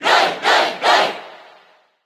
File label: Cheer Japanese; Roy; SSB4; SSBU